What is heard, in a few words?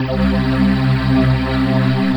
AIR VOX C2, VOICE; AKAI S-Series CD-ROM Sound Library VOL-7; AIR VOICE 1